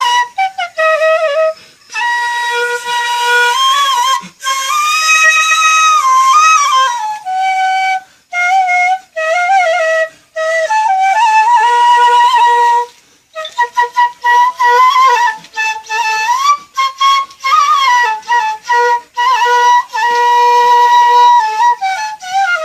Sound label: high flute; ringtone